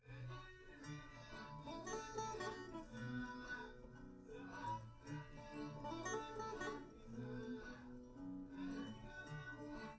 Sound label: nodrums liverec; underdetermined; SiSEC11